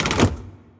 Lever

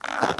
Hub-Train Strap Squeak

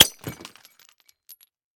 Impact Glass Wine Bottle Empty Drop Shatter; Impact Glass Wine Bottle Empty Drop Shatter 03; Frogsong

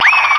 spin response

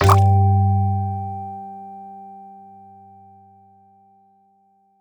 Bounce Wall

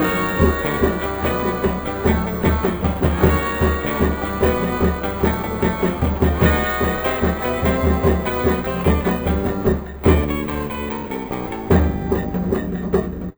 Timbales; SpongeBob's Next Big Adventures

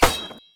spinner Wall Hit; unsighted

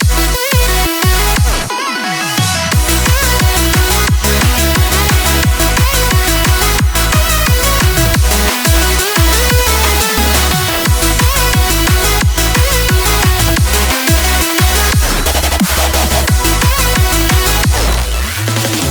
Music Select; beatmania IIDX 33 Sparkle Shower

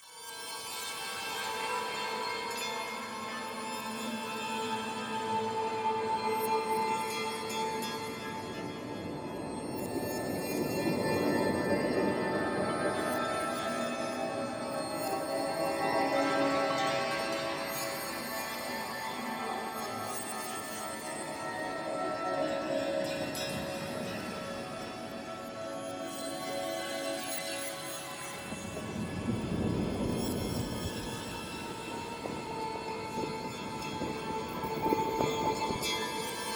Music Essence Room Ambiance

